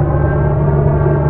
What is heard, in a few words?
Korg, FX